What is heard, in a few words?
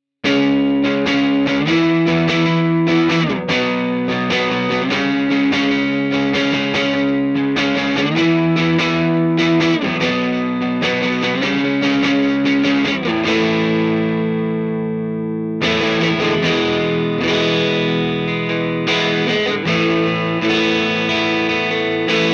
Firebird Neck Timmy OD Fender Deluxe, pickups; Revel